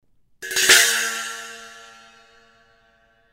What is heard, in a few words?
Oriental China, cymbal